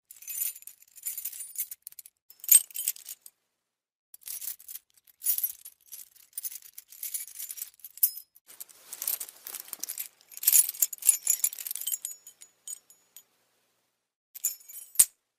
Sonido de Llaves Hogar; Efectos de Sonido para Videos de Youtube

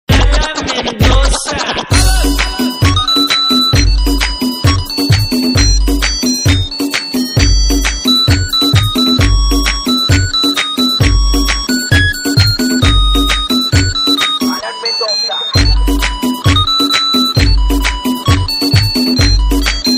REMIX

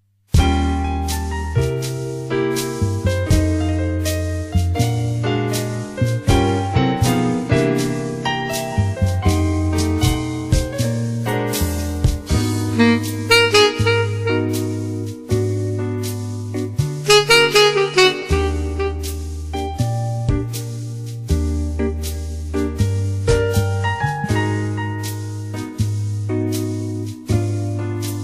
Música

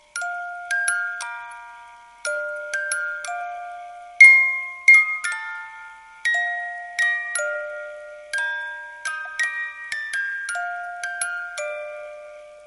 cajas registradoras

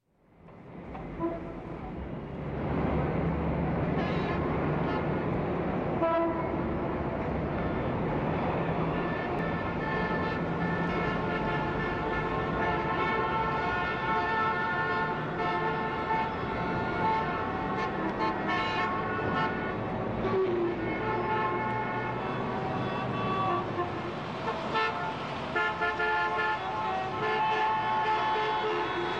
Trafico Circulación de Coches

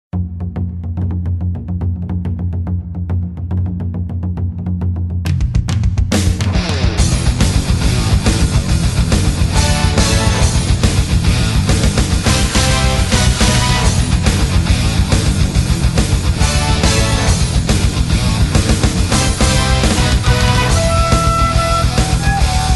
Musica de pelea; Naruto

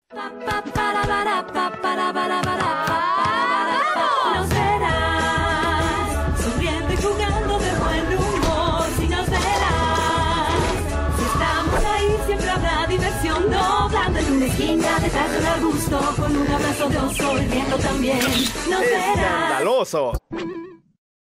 Intro Esp Latino letra; Escandalosos

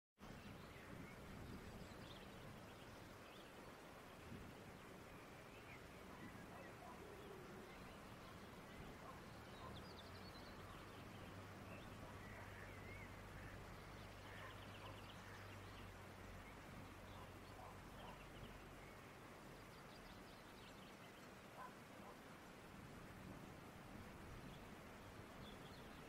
Ambiente en el campo efecto de sonido

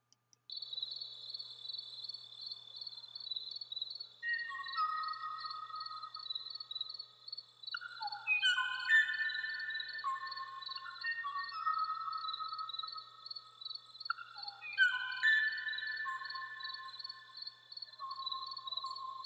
pajaro extinto canto del ultimo antes de su extincion

